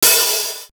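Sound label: Hi hat, Drum One Shots; AMG - Coldcut's Kleptomania VOL-1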